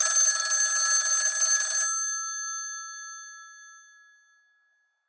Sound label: Western Electric 500, ringers